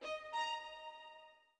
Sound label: orbit violin; Sky Children of the Light gamerip